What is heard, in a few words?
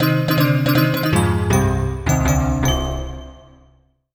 Bell Voice Negative